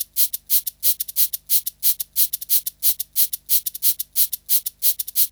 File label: Shaker Loop; Sonic Foundry (Sony Creative Software) - Fluid Dynamics Drum & Bass; Construction Kit; Percussion